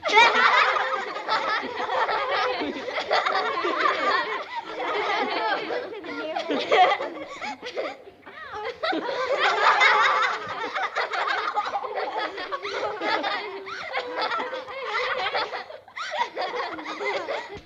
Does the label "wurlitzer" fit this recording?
No